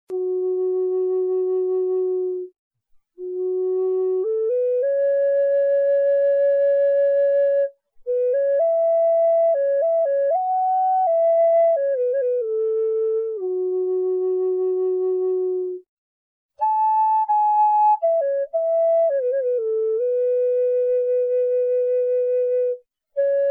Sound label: Large F sharp Mahogany, flutes